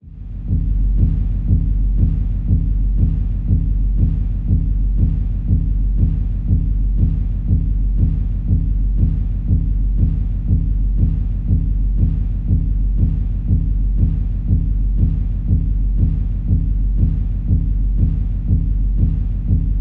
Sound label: Scene STEM Bass, Complex Cinematic SciFi Sounds; Federico Soler Fernandez